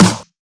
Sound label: Dark Snare Drum Sample F# Key, steel snare drum tuned to the F# note